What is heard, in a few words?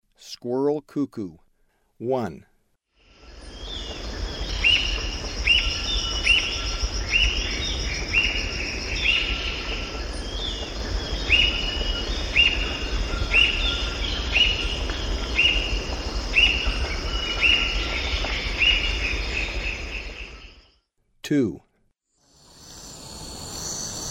Squirrel Cuckoo; S. America; Eastern Lowlands